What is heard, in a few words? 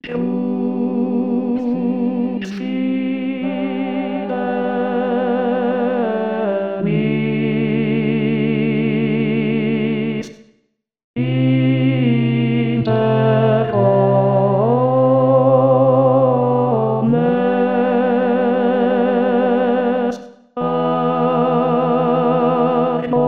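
Crux Fidelis Tenor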